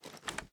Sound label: reload empty mag arm, reloads; gmod